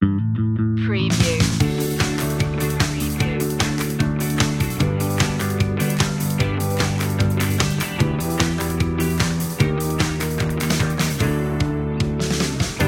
bright future inspiring

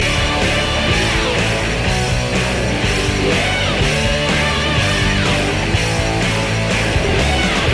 garage band loop

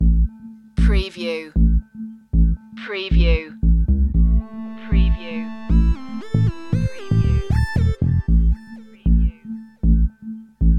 packing system loop

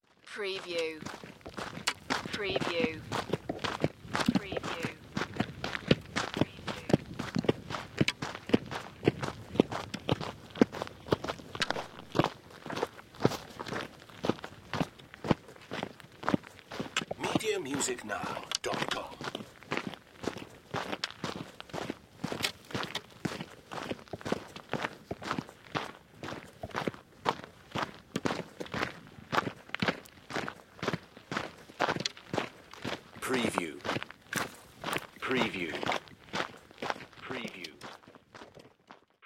Footsteps, two persons walking on gravel

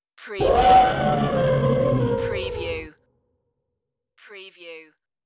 Elephant

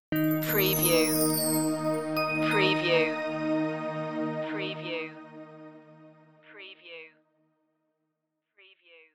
tech logo

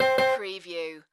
Casino Electronic Sound Winning Tone

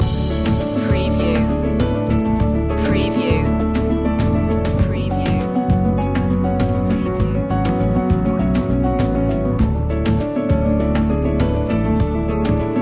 chasing piano loop

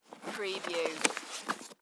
Cardboard Box Remove

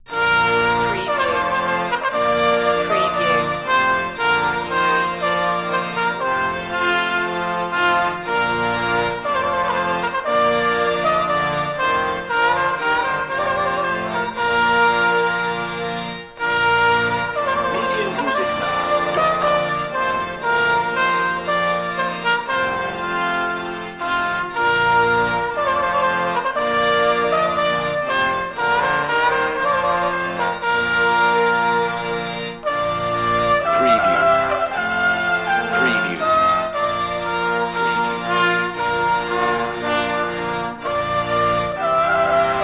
trumpet voluntary trumpet; clarke

